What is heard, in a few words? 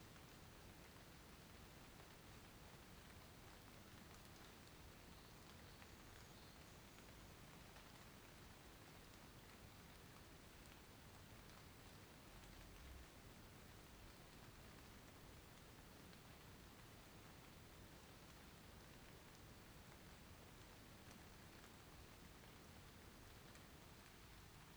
80 BPM F# Min Texture, Lofi; Melodies; Loop Stems; Cymatics; GHOST (Beta Pack); Blue Wish